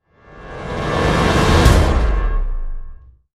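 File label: sting, action start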